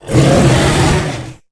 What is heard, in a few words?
Chillwind Yeti Attack